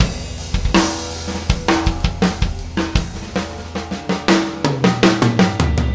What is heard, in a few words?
loop